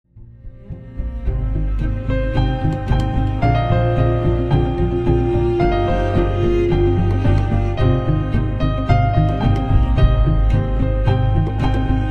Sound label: Piano